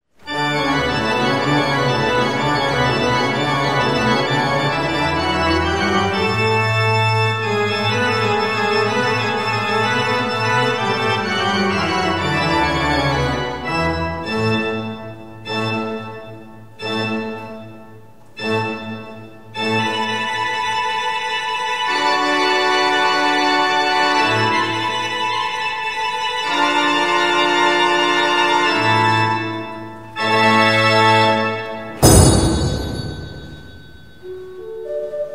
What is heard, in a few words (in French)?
Orgue; l'orgue Lingiardi de 1847; Saorge